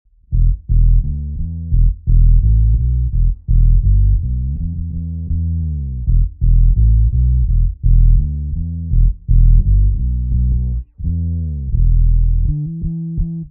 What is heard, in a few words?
Fretless Dub